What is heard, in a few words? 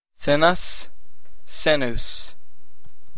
sinus